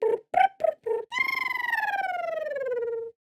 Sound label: kiwi med howl